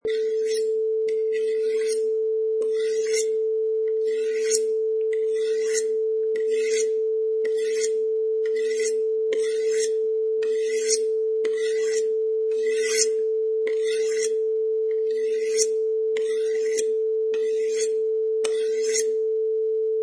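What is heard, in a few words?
Sharpening Knife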